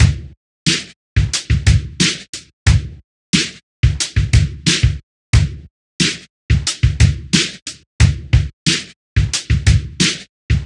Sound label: Experimental Downtempo